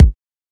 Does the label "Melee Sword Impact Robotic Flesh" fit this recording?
No